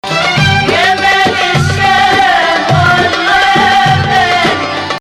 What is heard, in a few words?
Rast Ya Maal 2nd Rast